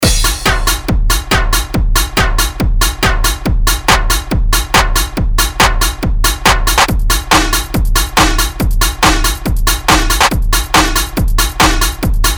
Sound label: Techno